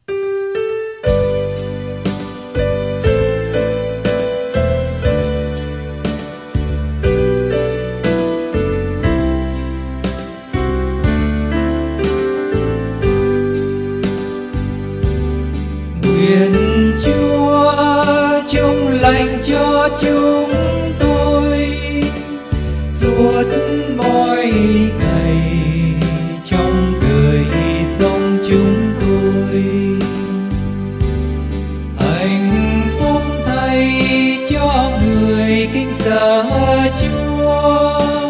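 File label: soprano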